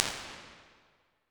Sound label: Small Hall, impulse responses, medium spaces, halls; Apple